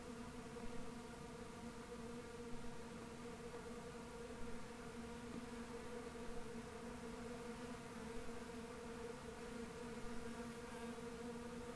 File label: Chousti Cave; Σπηλιά Χουστή